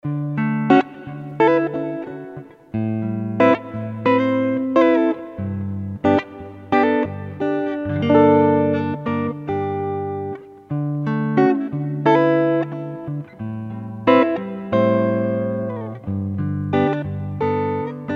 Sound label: R&B Guitar Grooves